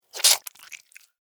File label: Spider Bite Fang, Spider, Bite, Fangs, Arachnid, Insect, Bug; Spiders; Sound Effects:Animals:Insects; Blastwave